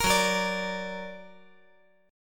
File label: F sharp Augmented F sharp; F#+ strummed